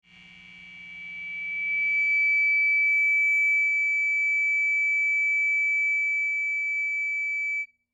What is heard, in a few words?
Guitar Feedback; Foley; Musical; Guitars